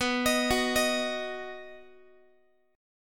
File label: B Major, Guitar, 4A,4E,5A,5D,5G sharp,6C sharp; arpeggiated; x,19,18,16,19,x